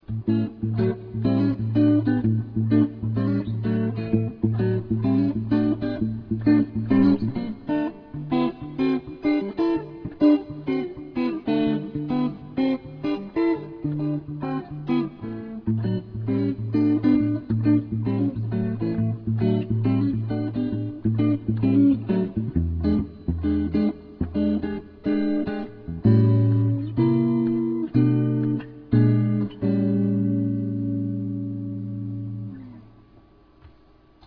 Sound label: Blues